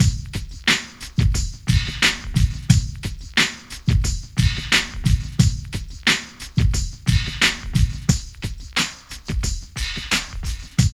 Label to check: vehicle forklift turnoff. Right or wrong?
Wrong